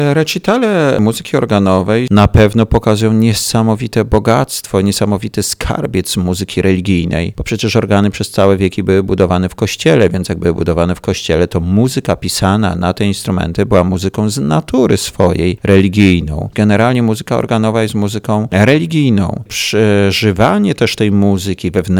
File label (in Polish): organy